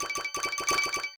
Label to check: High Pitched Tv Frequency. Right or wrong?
Wrong